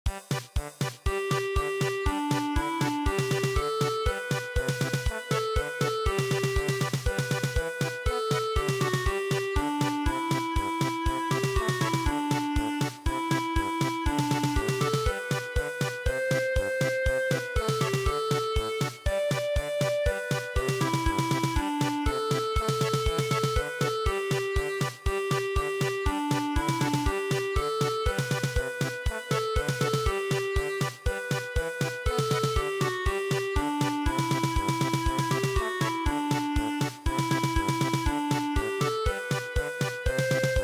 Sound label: German Polka; four seasons rain